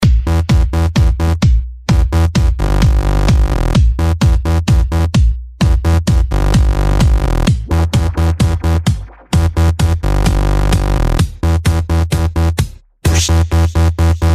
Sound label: Dj Bass Sms